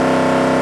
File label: rpm, Engine; Cars; XBOX; Trophy